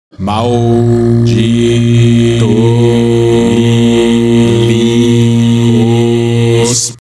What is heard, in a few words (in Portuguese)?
monges Om